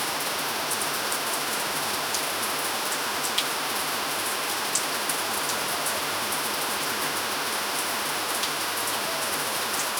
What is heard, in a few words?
natural immersive rain so, village